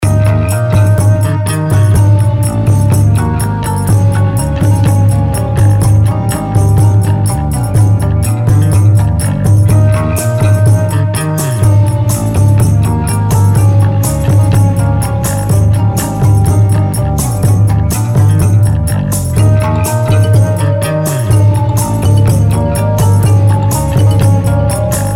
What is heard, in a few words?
folk; maoudamashii